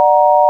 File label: sine